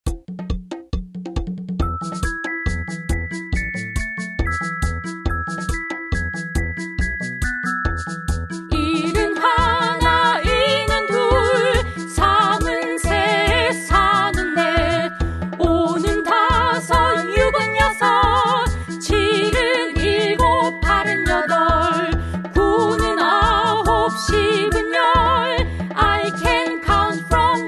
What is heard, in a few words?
korean arirang number song